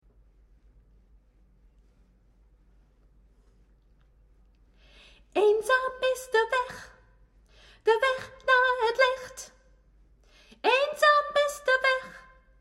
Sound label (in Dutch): achtergrond stem sopr.